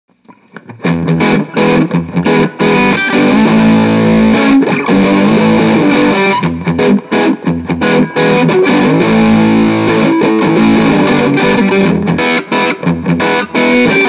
Heavy Loose

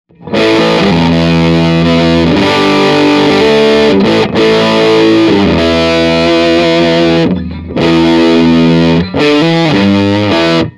fuz various

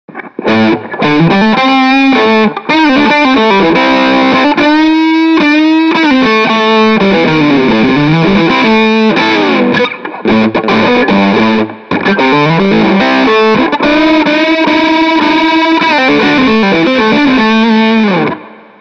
Loose High Fuzz Medium Tone

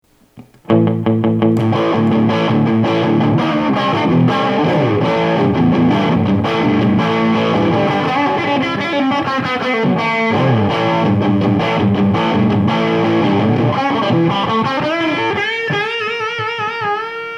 fuz moderate tight

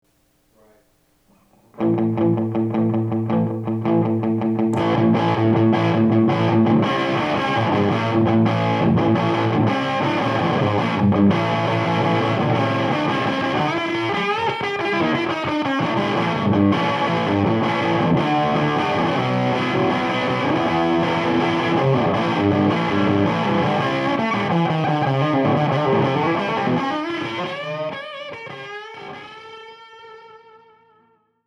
les paul edge

